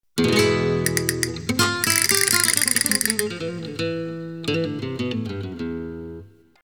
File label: Guitar mono